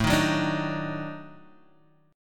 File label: Eb Minor Major 7th Flat 5th